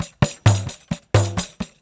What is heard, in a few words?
Pandeiro, 4-4 Drum Loops, Perc Loops; Drum Tools